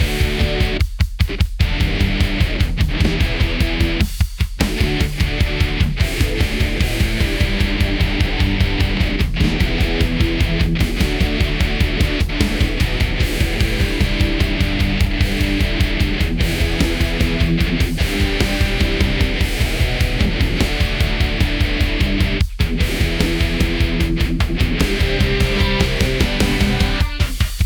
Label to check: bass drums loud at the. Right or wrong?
Right